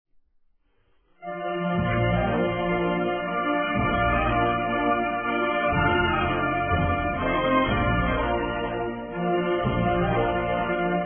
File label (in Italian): Inno Nazionale Italiano